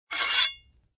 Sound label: Knife Scrape